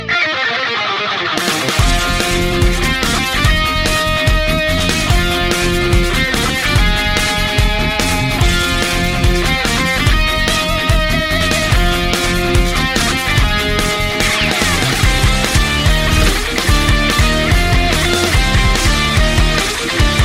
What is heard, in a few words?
Metal; Contra Stage 1